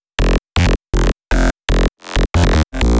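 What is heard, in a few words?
bass loop F minor; HiKARA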